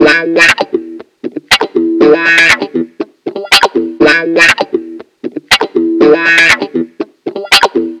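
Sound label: guitar, wah-wah, disco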